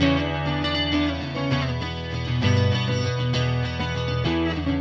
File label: Acoustic Guitar; Guitars